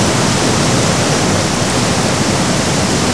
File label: waterfalls